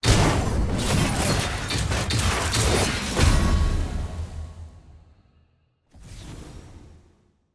tier wings promotion to grandmaster; ranked

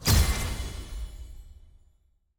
reveal click; yourshop

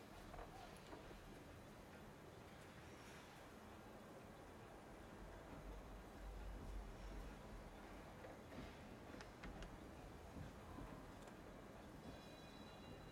sfx amb archive main

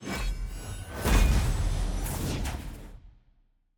portal open outro; loot

